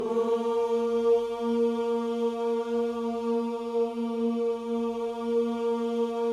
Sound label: OOH A#3, Gospel; Spectrasonics Vocal Planet